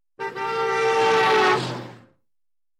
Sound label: Truck Passing by Honking